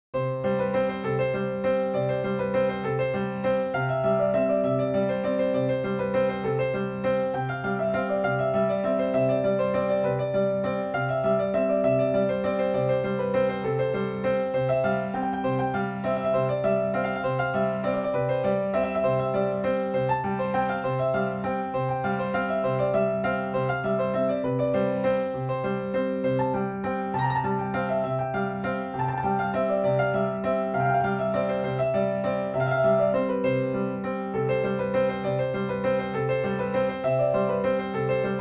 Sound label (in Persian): Chaharmezrab, piano; Rast-Panjgah; Radif Mahjoubi